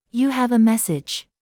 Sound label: you have a msg; QuantumTerminal